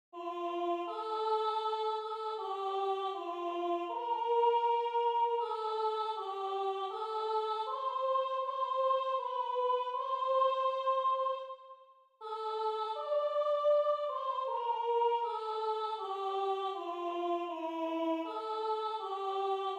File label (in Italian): Sei tu Signore il pane Soprano; Base soprano